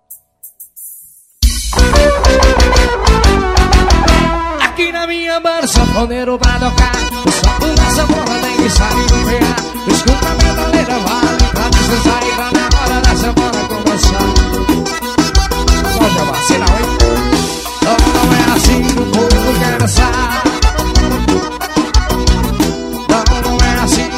forró, sanfona

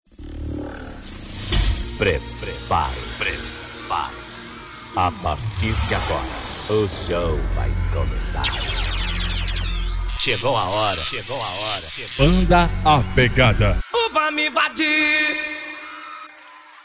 abertura

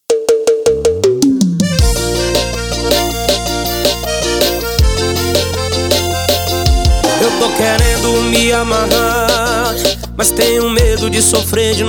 piseiro romantico

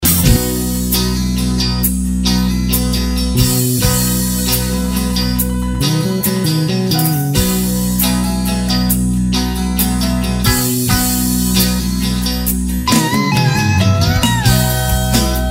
rock nacional medley; inconcert music arts

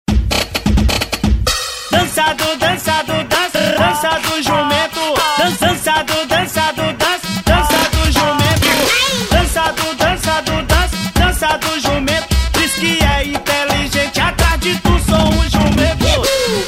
toque de celular

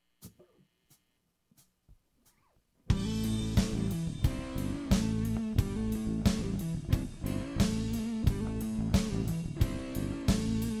improviso rock

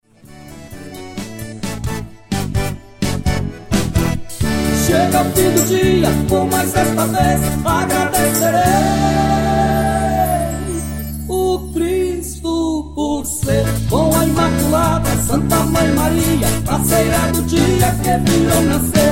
canto de entrada; missa sertaneja

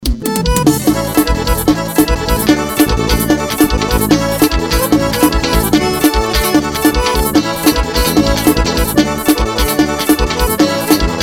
forro

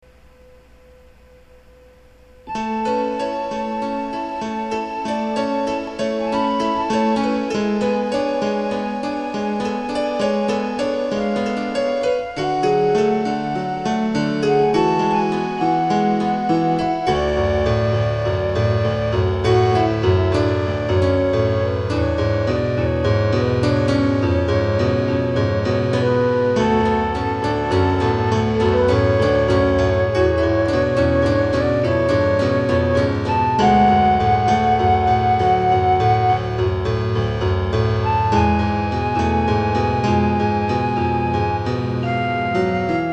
MPB nostalgia instrumental

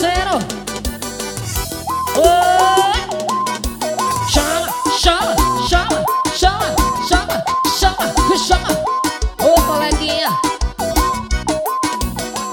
forro ao vivo; taquari

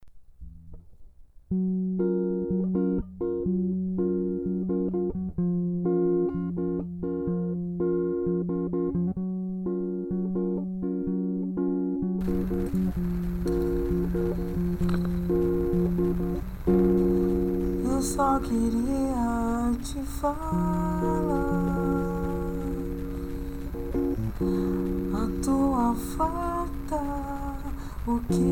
samba em 2 notas

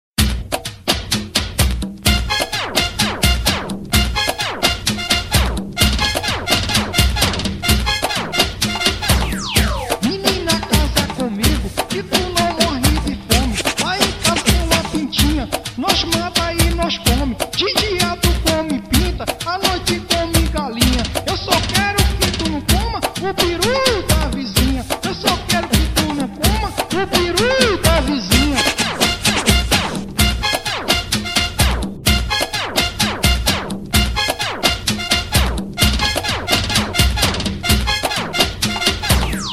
funk novo

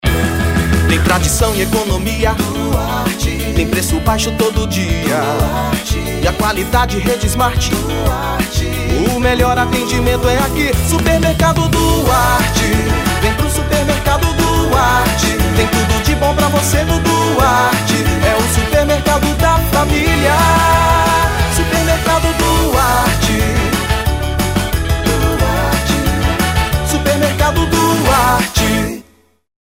jingle supermercado; supermercado duarte; estudio hilton avelino

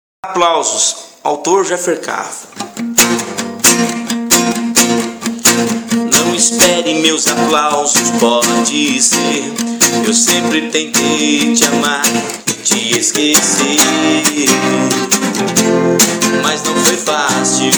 aplausos

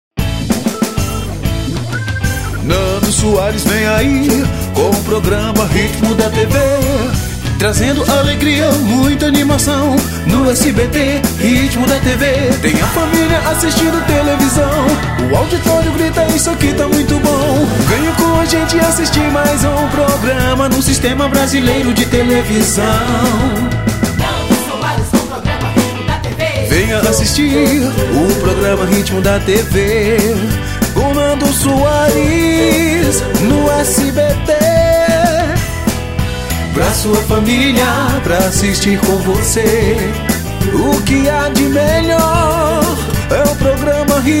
voz masculino

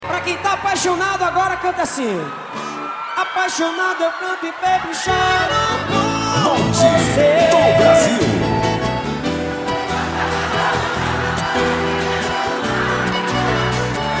canto acustico ao vivo

